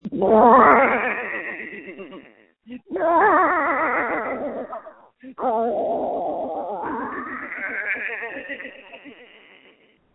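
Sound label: screams that feature laughter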